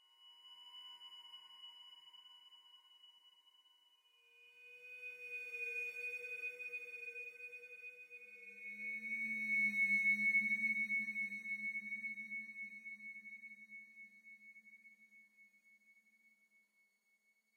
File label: LEAD